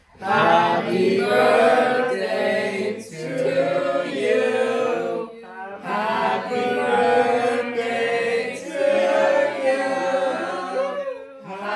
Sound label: happy birthday song